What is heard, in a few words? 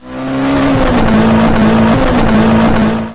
car engage